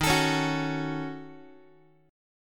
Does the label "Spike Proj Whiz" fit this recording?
No